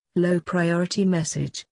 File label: Low priority msg